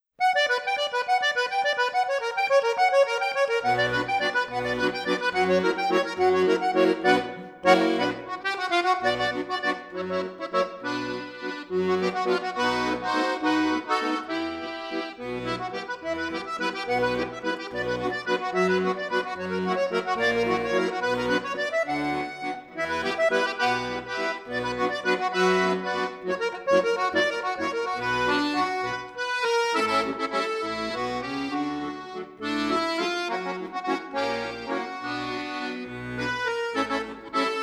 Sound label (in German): Akkordeon Komposition